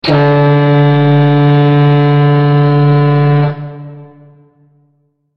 fog horn

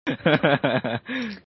hahaahah

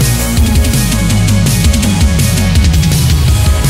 chune fill

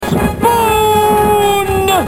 tick spoon

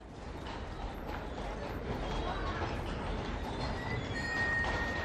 Street Background Sounds

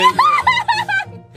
laugh creepy

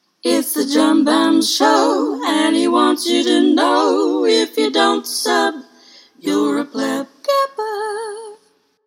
jingle; BAM

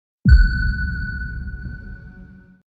ping sound; the martian sol

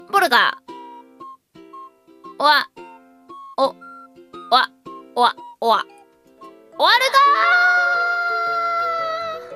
polka

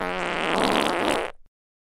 funny fart sound effect rigid fart sound effects

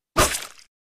knife slash

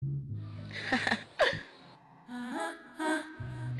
laughing

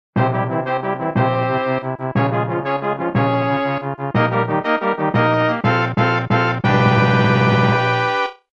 castle clear; smb1

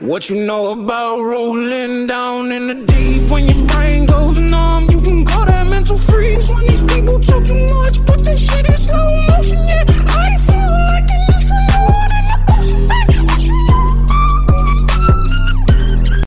voice crack

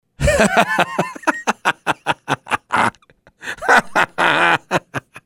laugh track

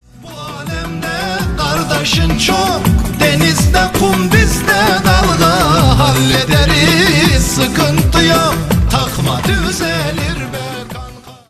twinkle music

